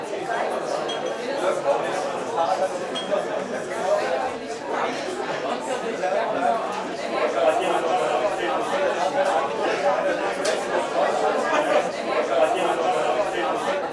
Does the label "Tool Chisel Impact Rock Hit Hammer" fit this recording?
No